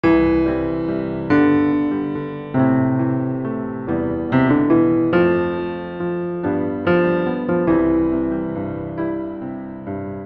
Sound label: Piano Bass